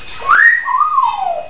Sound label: wolf, parrots